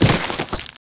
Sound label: drop